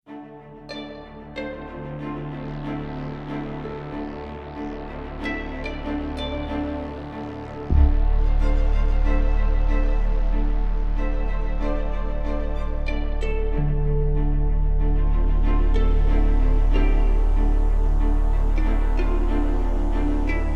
String; The Cat Lady

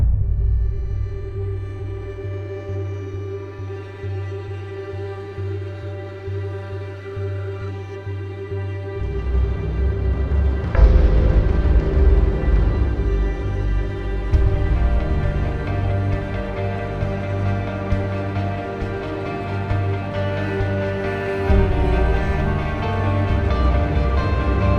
Menu Loop; sundered eldritch edition; windows

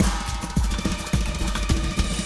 Guards Panic START; bolt 2008 pc gamerip

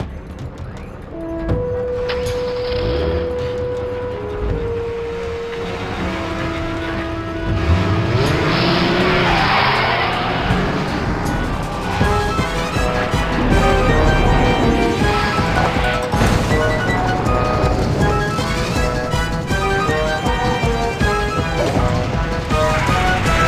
Intro Cinematic SFX; lego racers

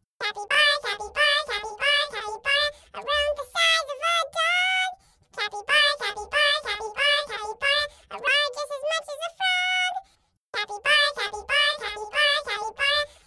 Chorus (Vocals Only); The Gecko

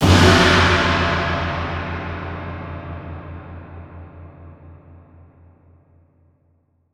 Secret Gong; Serious Sam 4

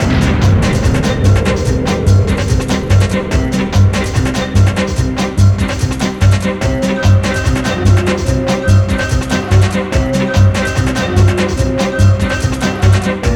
Fight

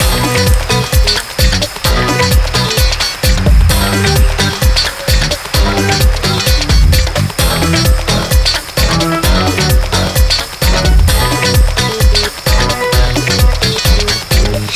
Intro Loop

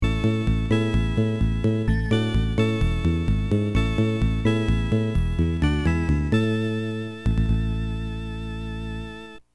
Level Complete; Super Raiden; TurboGrafx-16

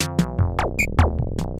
Clockwork Machines Lovely; LSD Dream Emulator; ps1 gamerip 1998

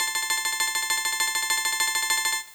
Score Counting

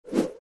Twirl; Fancy Pants World 1 Remix

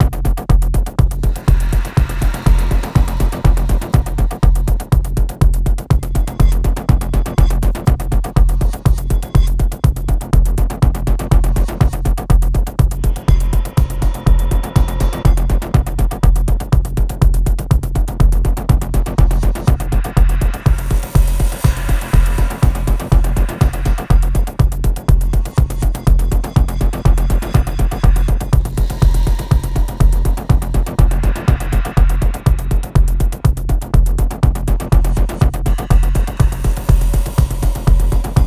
pulse

